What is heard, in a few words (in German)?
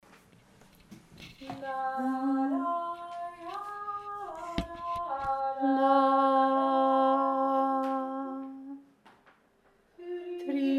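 tr u li u li tiefe stimme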